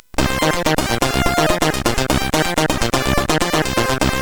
Test, CSG8580R5; hvsc

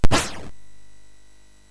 SFX, MOS6581; Narco Police; hvsc

